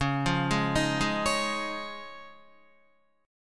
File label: C sharp Major Add 9th C sharp down Guitar; C#add9 arpeggiated; JGuitar